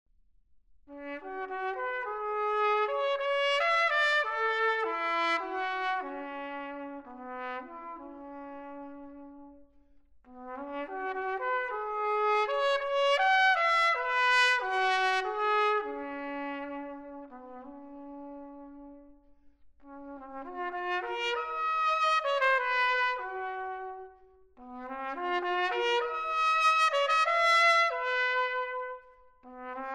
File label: Sonata For Trumpet, Unaccompanied; solo